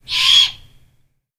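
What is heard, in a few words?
rural random screech owl; random amb sfx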